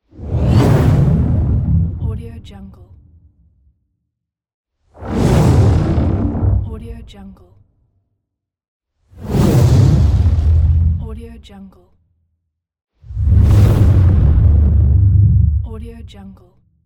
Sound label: alien whoosh; transitions and movement